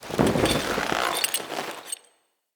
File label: Idle Screen Foley Slow; Kuva Lich